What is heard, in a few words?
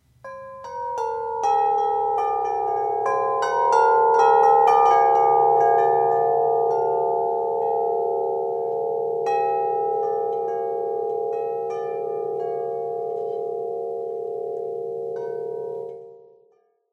Twilight Series Music of the Universe 84in, metal wind chimes